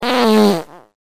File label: fart